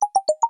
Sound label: Dripping; Tecno Spark Go 2023